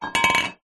household cup metal camping knock over wood counter; zapsplat